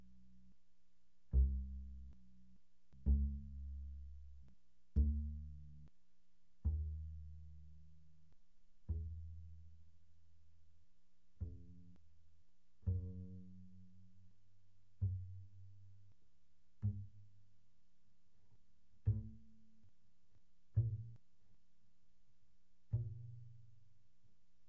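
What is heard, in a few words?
Bass pizzicato pianissimo sul E C2 B2, doublebass; Strings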